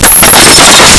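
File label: stone collapse rocks crumble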